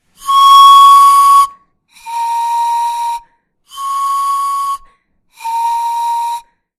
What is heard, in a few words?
Panpipes